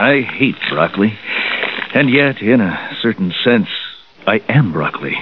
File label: TICK